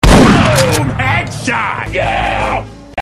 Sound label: headshot; boom hs